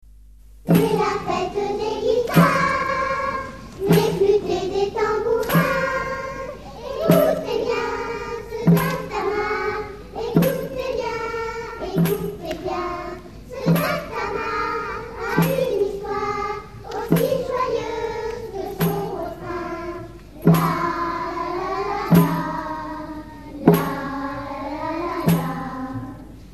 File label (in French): Guitares; La fete des Guitares; Concert Vendredi 26 mai 1989 20h30